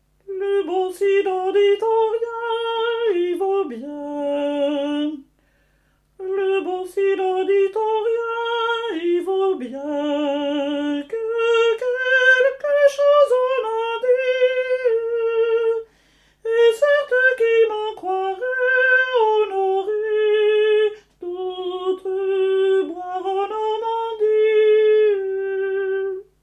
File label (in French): le bon cidre soprani